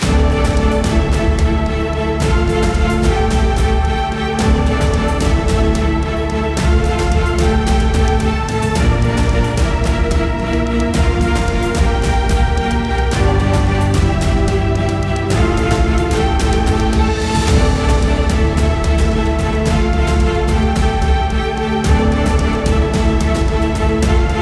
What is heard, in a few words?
Fighting Loop; Gong Ting Fighting Loop; bright memory mobile